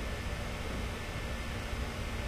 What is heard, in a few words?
spawn tone; Source Engine; tf; lair